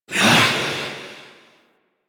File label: Vocal Melee; Warframe; Wraith